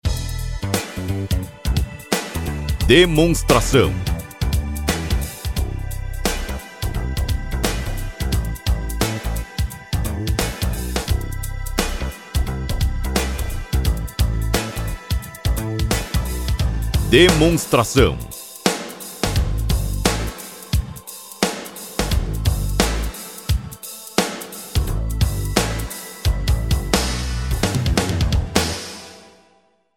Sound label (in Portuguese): Trilha